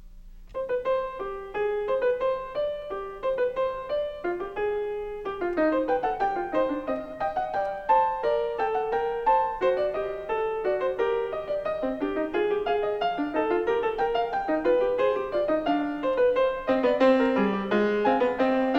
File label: Fugue No. 2 in C minor, Bach, Well-Tempered Clavier; BWV847